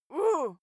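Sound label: female pain, screams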